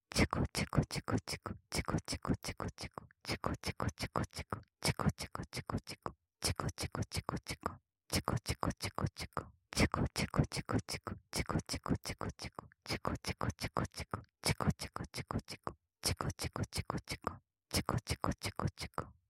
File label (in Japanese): オノマトペ素材 ちゅこちゅこ（早い）